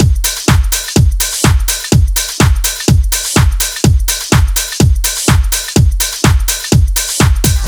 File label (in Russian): Groove King D, диско хаус